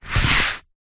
paper sheet a4 push by hand on table; foley

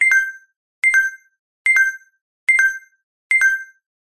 hospital monitor beep alert chime

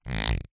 industrial ratchet plastic turn clicks

